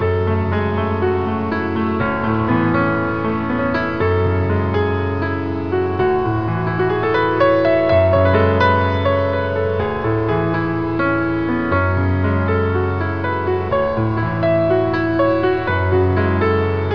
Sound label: piano